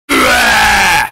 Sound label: sleeping cat